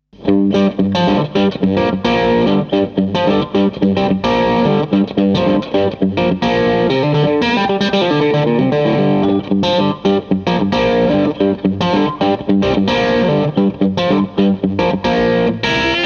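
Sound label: TELE 55 Crunch bridge middle neck JMP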